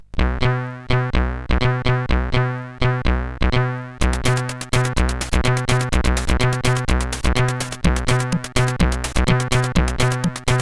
Digi sid CSG8580R5; Early Tunes; Digi Collection

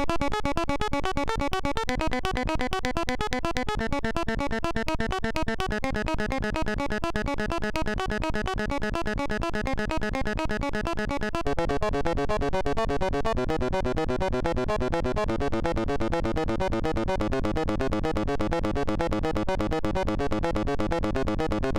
Classical, sid MOS6581R3

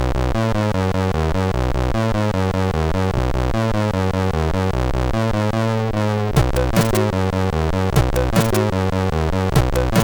Game music, sid MOS6581R4

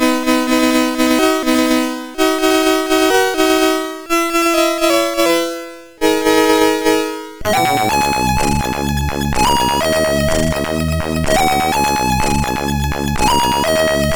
intro sid MOS6581R4

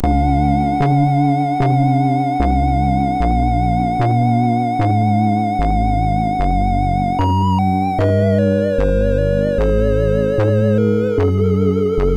Zipper 3 note, MOS6581R4

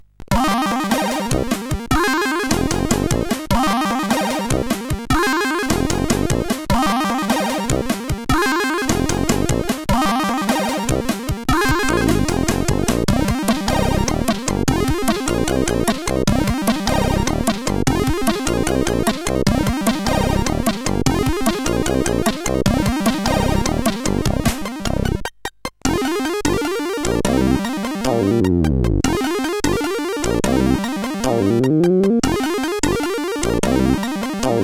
For Kheela, CSG8580R5

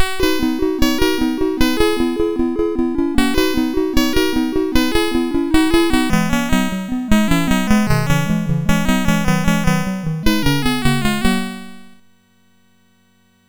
Narrow Squeaks, MOS6581R4; Orm and Cheep

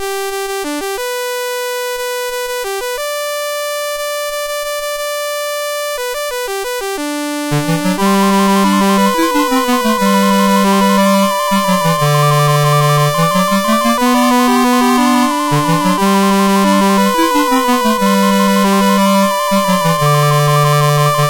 Them Basses, MOS6581R3

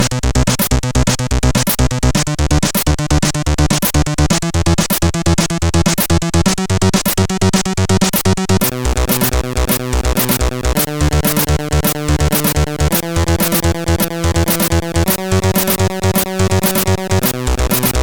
CSG8580; Bring Gift

